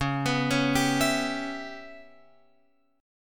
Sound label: C sharp Minor 7th Flat 5th C sharp Guitar Open F; C#m7b5; arpeggiated; x,8,11,8,10,11